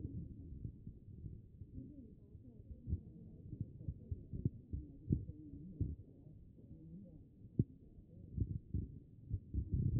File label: A CHEST; B MODE